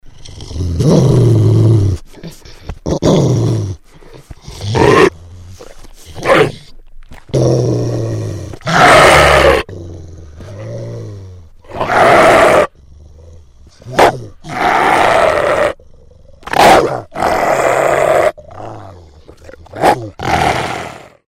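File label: sound of wolf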